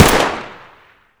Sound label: p226 fire